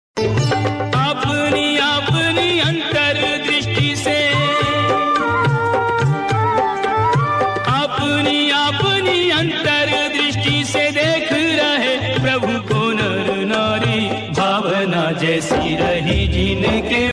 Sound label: old song, Hindi song; ramayan